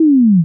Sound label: lose; BlockSeasons